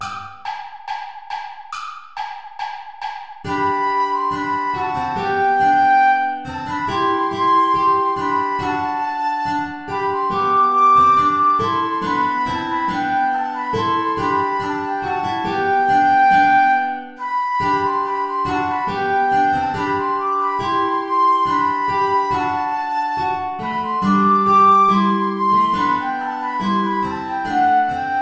play along without guitar; praetorius michael ballet

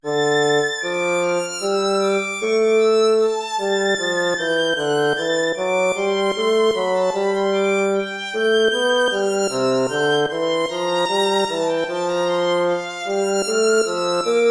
air, hornpipe

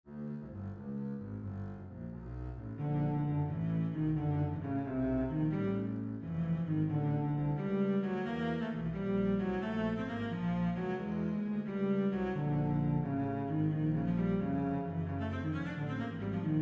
adagietto for cello double bass; verschaupen anton

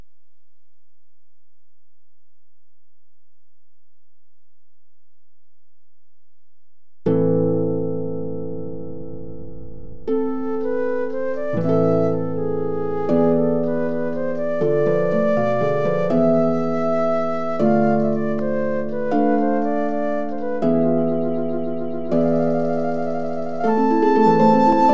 prelude for flute oboe and harp; frame steven movements

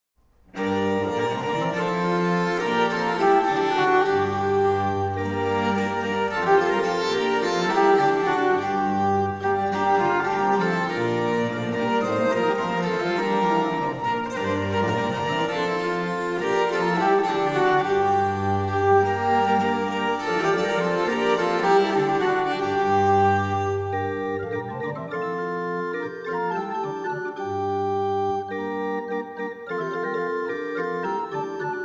keyboard transcription; henry viii king; las madame

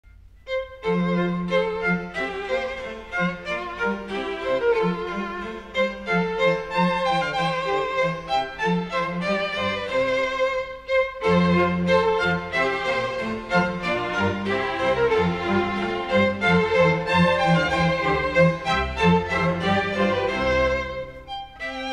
gavotte; corelli arcangelo

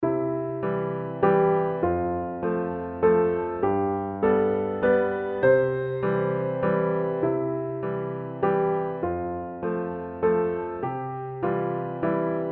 easy piano duet; traditional; humpty dumpty

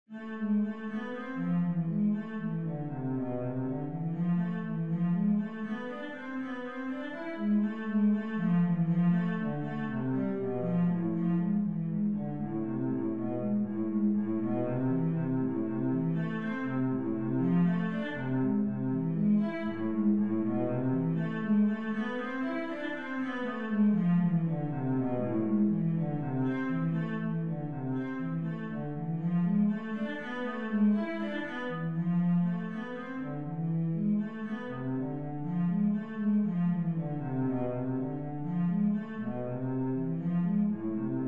scale study minor key; lewis alastair

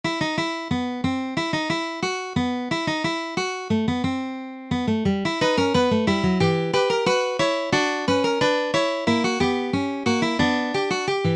fugue for two classical guitars; bach johann sebastian

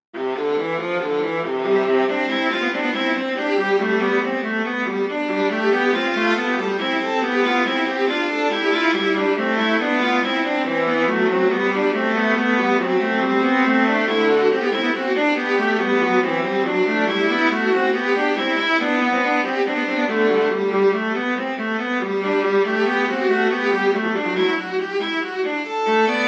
invention c major for viola duet; Johann Sebastian Bach

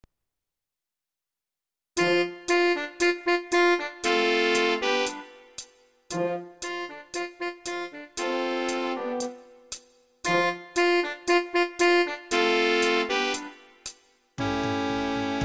sax quartet; romo soto raul; fuerte piano

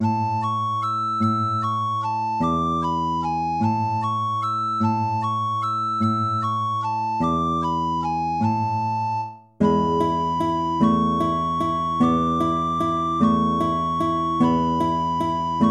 waltz; Carulli Ferdinando

